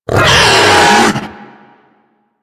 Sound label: Infested Juggernaut Vocal Attack; Warframe